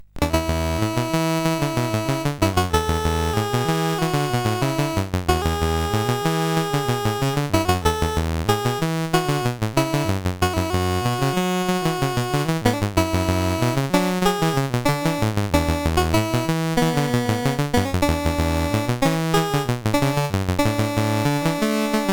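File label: Magicians Ball, MOS 8580 SID chip music, games; CSG8580R5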